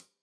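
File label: hat closed, hi-hat cymbals stereo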